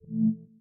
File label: hover